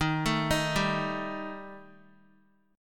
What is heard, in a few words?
D sharp Major Flat 5th down Guitar; D#Mb5 arpeggiated; 4F,4A,5C,5G,5C,6E; JGuitar